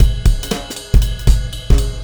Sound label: Shuffle Beat